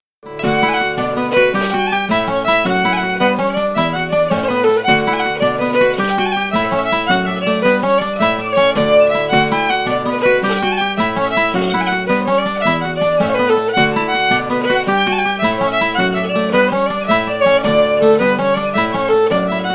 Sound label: Miss Eliza Mae Cawley (Jig), fiddle, guitar